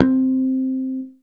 E-Mu Proteus FX Harmonic C4

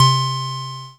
Casio MT-600 Light Harp C3

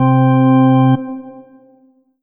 Alesis Sanctuary QCard Tonewheel C3

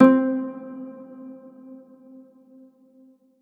Roland U-220 Harp C6

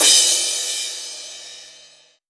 Yamaha RM50 Crash Cymbal

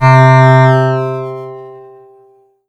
Yamaha PSR-22 Synth Organ C3

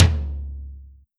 Yamaha RM50 Low Tom

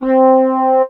Casio CTK-611 French Horn C4

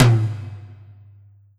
Mid Tom; Korg N1R

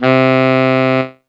E-Mu Proteus FX Tenor Sax C3

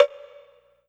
Korg N1R Low Wood Block

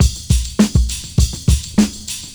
Ensoniq ZR-76 StakLoop

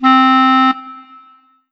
Korg TR Rack Clarinet C4

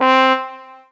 Yamaha TG55 Trombone C4

